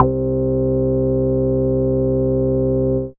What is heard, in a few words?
Moog Bass, Dance Synths